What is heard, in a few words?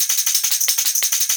D&B Percussion Loop; neuro